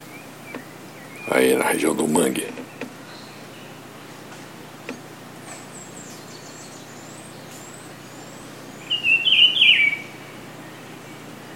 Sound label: Cyclarhis gujanensis, golfe; I Comandatuba